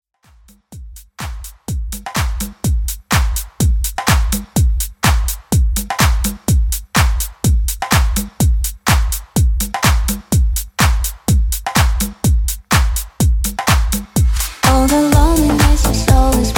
Club Extended Mix 125 Bpm

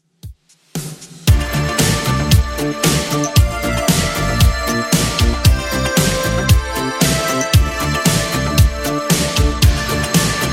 80s Redrum 115bpm

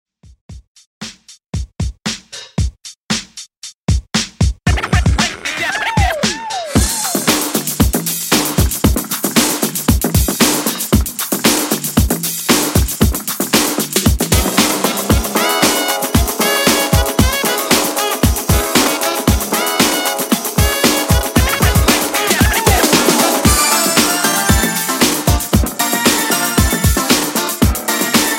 90s Funk Redrum 115Bpm

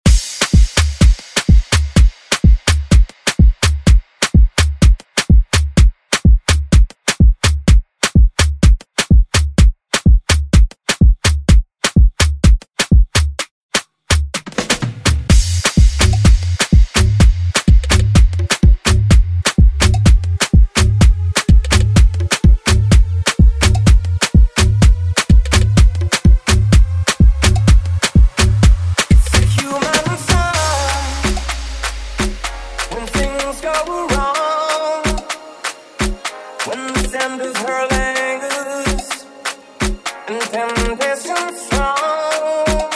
Afro House; DJ Mhark

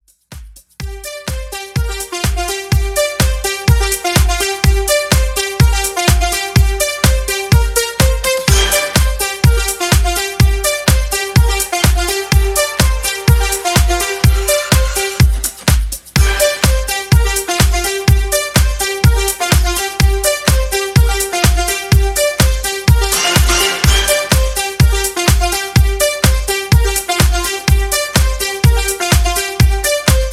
Disco Re Drum 125 BPM; Extended

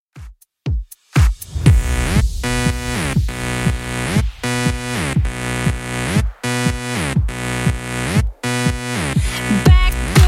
Redrum 120bpm

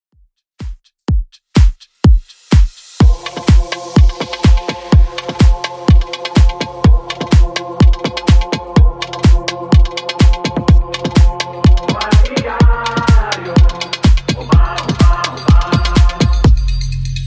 Afro Redrum 125bpm

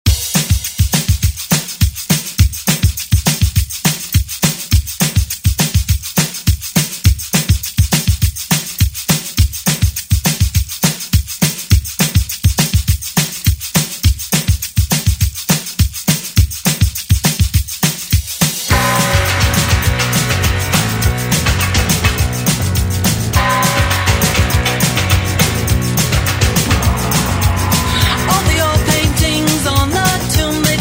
Straight Kutz; DJ Mhark

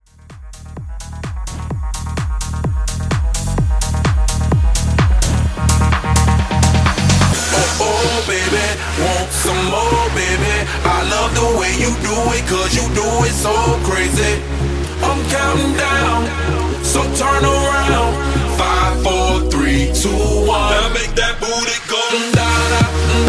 128 CLEAN

